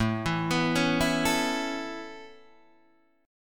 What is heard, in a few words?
A Major Add 9th A Guitar Open D, chord